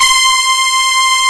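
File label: RICH BRASS C2 left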